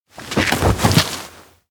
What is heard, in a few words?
lift jet; chiharu; anemoi